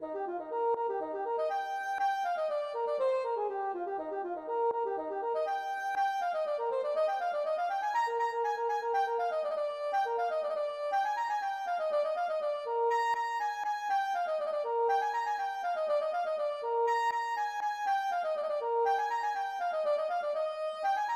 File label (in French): polka Scandinave